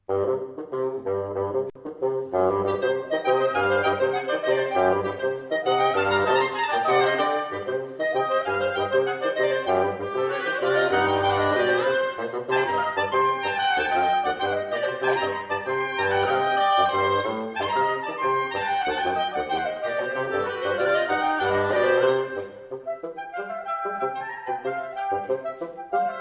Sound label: Klezmer Freylechs